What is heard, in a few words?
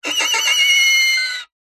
musical violin bowed scrape badly source for horror sound design